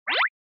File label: Motion Pop